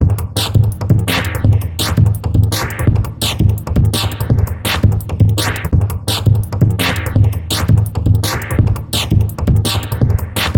Orange Vocoder Drums; Zynaptiq

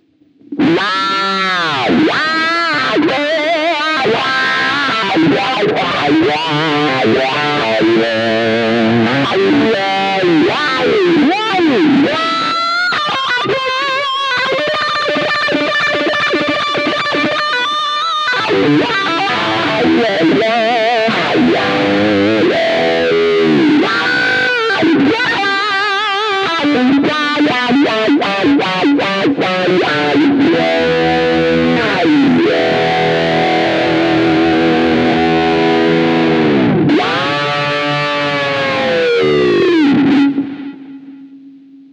Cry Baby Higain; Zakk Wylde

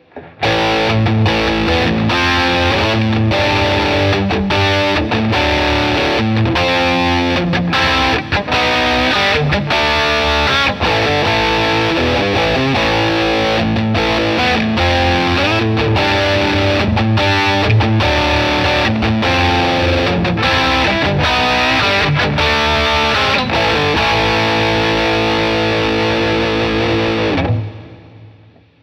Headrush Drive Hi Gain Lead; Software Update Glorious; Red

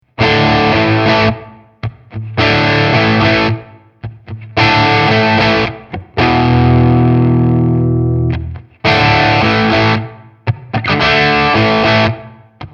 Nux Trident JCM 800

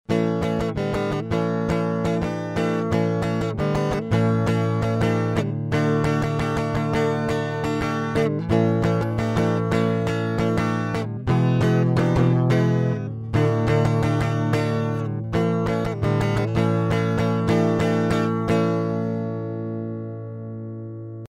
Bus Exciter Guitar Low Boost

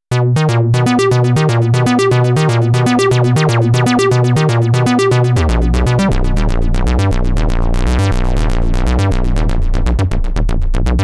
elektron analog rytm square waves

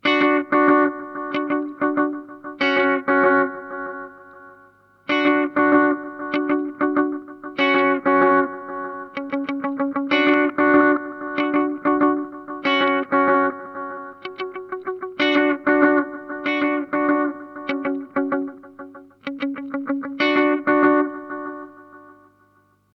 Boss DM 101 Multi Head

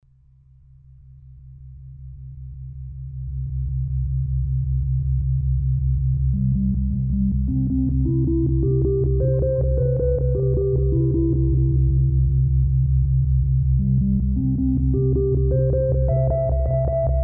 Space Orchestra

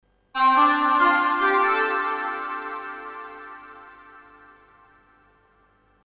KA Vector Synth; Iridium

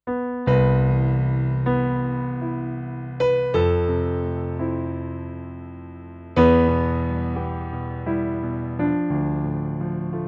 Yamaha P 125 Piano

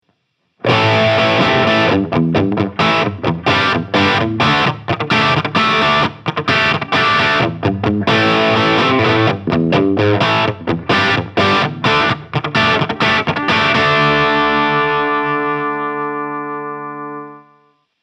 Solar Guitars Canibalismo Crunch

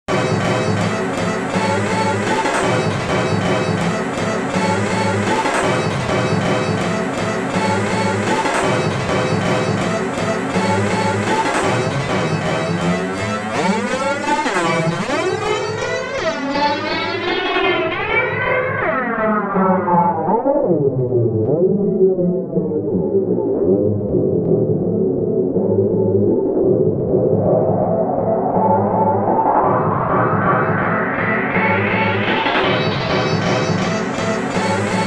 Machined Strings; Sequential Prophet X

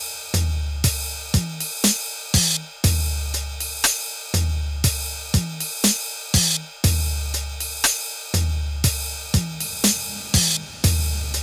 NTS-3 Drum Space Reverb

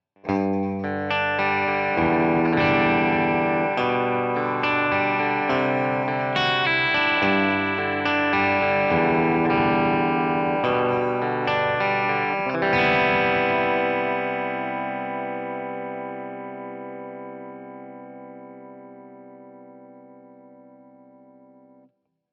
Schecter SLS Elite PT Bridge

